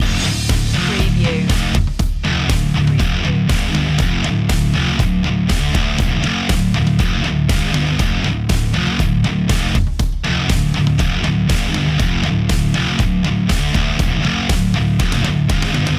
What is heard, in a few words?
pump action loop